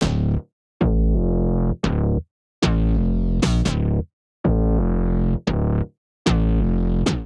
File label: D Minor 132 bpm, Bassline; MIDI Clips; Tonal; Skitter and Step; Ableton Factory Packs